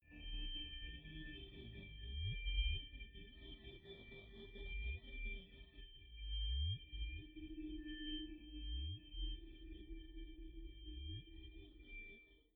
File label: space scary forest chirp call